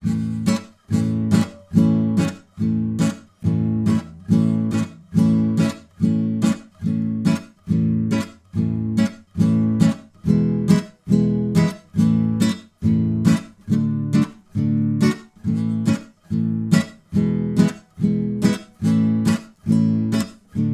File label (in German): Gitarrenbegleitung; Die Affen rasen durch den Wald